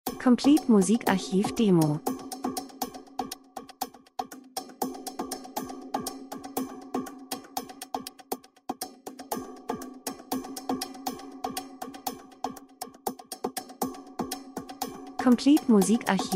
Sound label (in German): drums, rhythmus; Variation Percussion einfach Traditionelle Trommeln Sounddesign Land und Leute Buschmann Reisefilm Natur Dokumentation